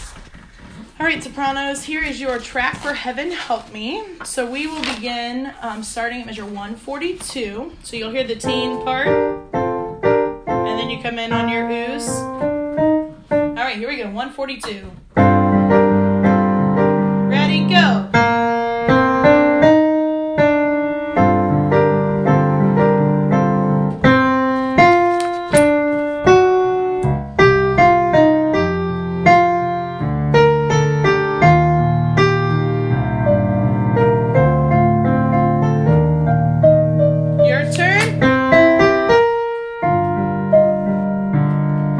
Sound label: soprano